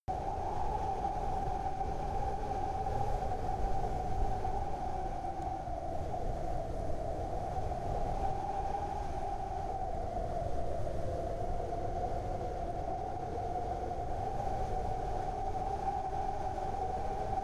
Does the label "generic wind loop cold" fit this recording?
Yes